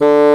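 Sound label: bassoon D3; East West - Global Sounds; WOODS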